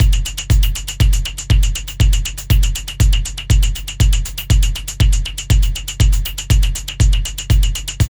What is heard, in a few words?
LOOP, Tekno Industrial; East West